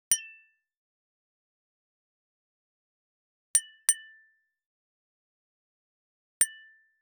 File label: the crisp high pitched cl, glassware